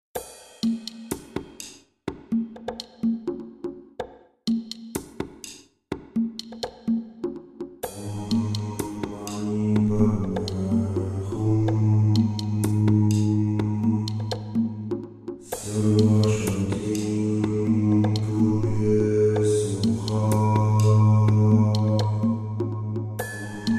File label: om mani padme hum, 432 Hz